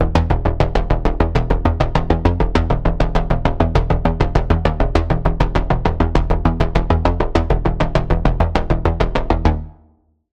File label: Pulse; The Architect; Routed Sound; Spire Expansion; Quantum Enforcer Vol.1